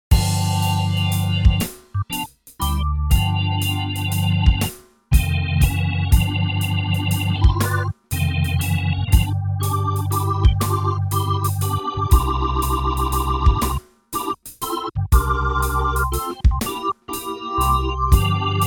Gospel

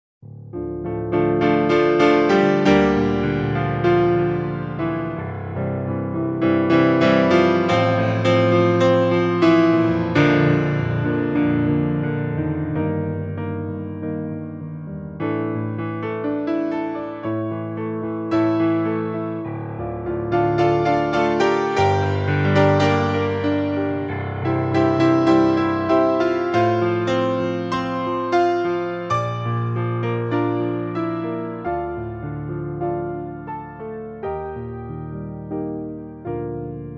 Kawai

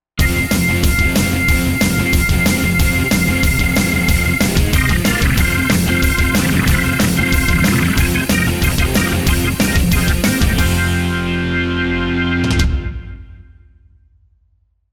Rock

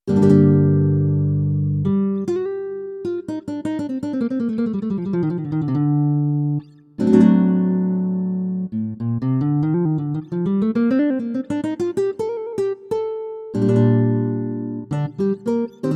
Fast Strums; M3

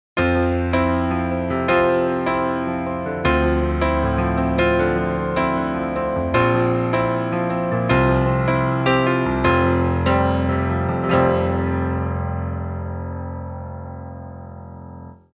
Baldwin Komp Brt

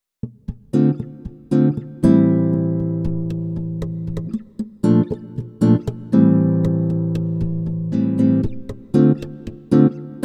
Comping with Perf Noises; M3

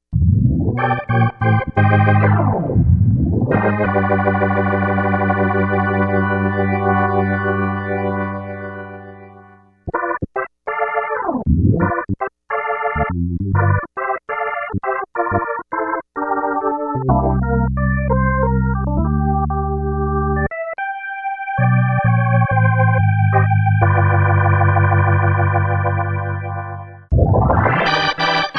KB3 Custom medley

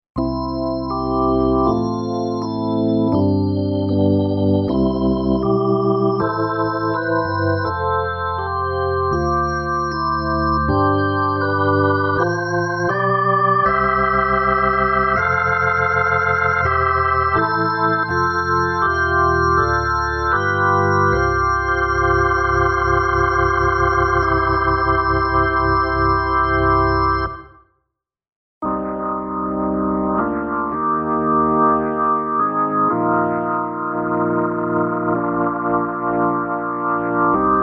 Ballad and Rock Organs

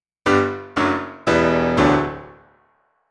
Full Release On

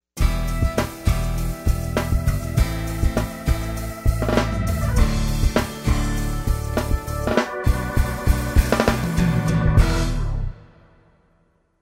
Rotary

